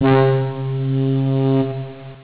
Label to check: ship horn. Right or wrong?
Right